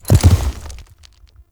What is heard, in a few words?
Horse Jump Land Heavy; Vehicles; Foley Actions; Warframe; Duviri